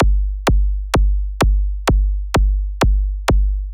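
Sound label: Drum Loop 128BPM bass drum G; VEC5 Drumloops; VEC5 Multi Loops; Loop Elements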